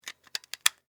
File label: Pager Close Battery Compartment; Telephones